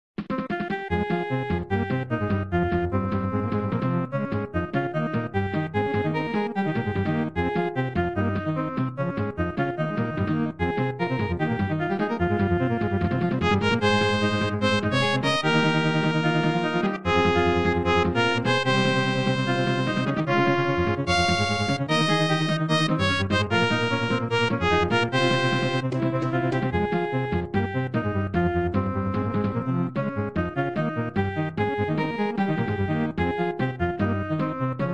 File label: Boss fight; Super Kitiku Mario; Brutal Mario